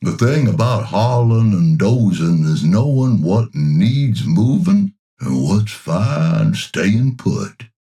Equipment Hauler Dozer Forklift, Construction Vehicle